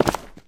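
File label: cleats concrete, footsteps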